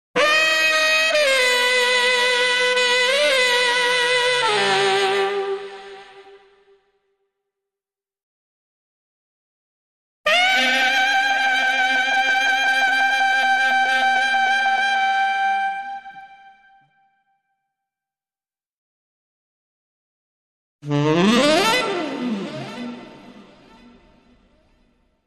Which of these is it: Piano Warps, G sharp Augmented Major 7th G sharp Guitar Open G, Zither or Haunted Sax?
Haunted Sax